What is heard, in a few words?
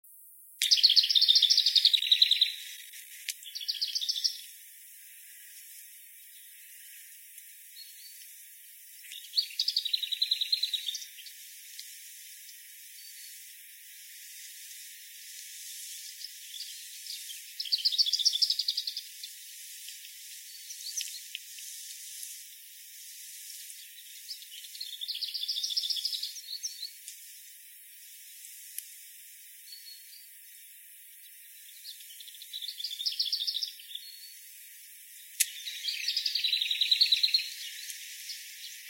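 lesser whitethroat voice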